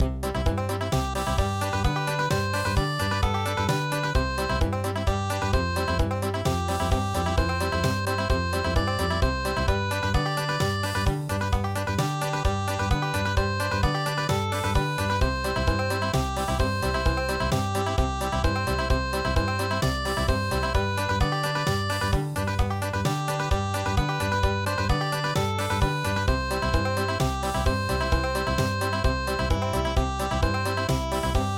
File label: Country, traditional